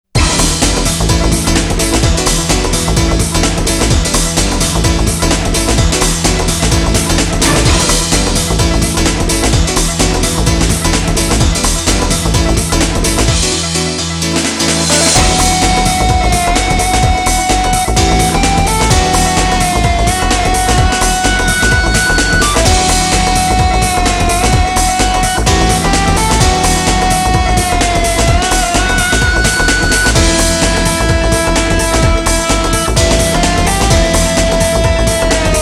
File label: Instrumental Mix; Sega Rally 2